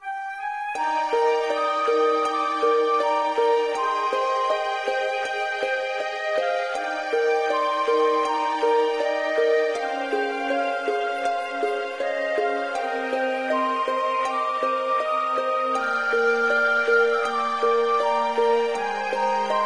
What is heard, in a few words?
slow; MapleShrine